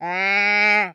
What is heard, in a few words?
Animal Toad Annoyed Moan; animals; AdobeCC